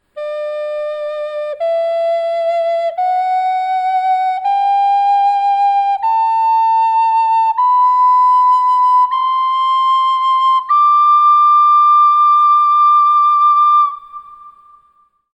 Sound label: duet left